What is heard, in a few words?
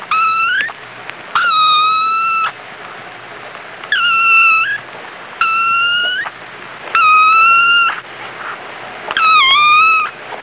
baby kitten, pets; Sound Effects for Multimedia - Volume 1